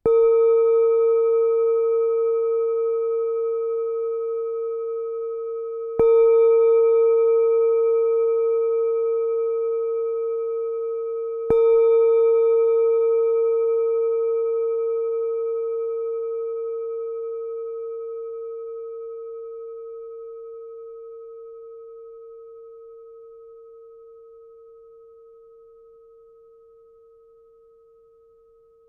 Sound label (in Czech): tibetska misa